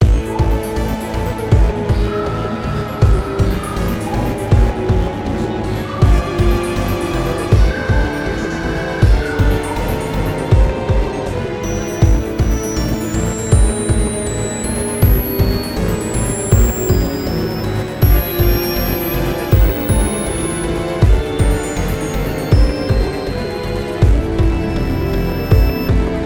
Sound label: BGM; real dasshutsu game × nintendo 3ds chou hakai keikaku kara no dasshutsu